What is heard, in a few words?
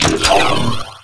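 boost weapon; PC World Komputer 1998 May; rrdemo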